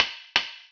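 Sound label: snare, EMU Orbit-3 drum machine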